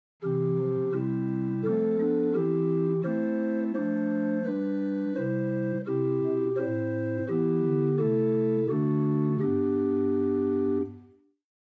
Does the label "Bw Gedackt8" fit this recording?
Yes